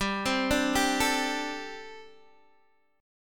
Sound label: G Suspended 2nd Suspended 4th, Guitar DADGAD